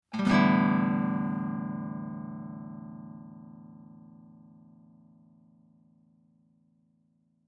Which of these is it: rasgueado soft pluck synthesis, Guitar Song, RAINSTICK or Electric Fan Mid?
rasgueado soft pluck synthesis